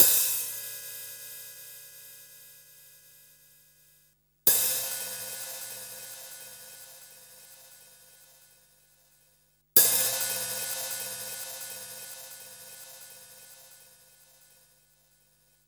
Open Hats; Hats shakes bells; The Loops

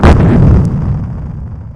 bang; Peripheral Empires

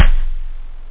808 kick; OctaMED SoundStudio V1; jazzies samples; perc

